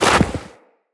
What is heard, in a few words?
Walk Deep Snow, Footsteps; Operator